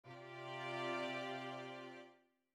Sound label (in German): Der Quartnonenaccord durch Hinweglassung der Terz und Septime